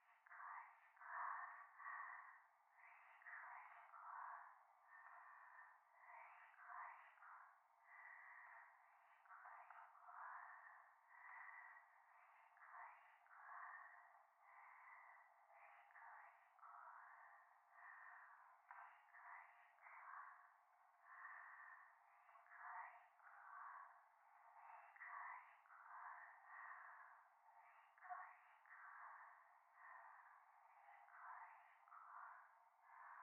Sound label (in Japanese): しこしこ 電車; 夢の中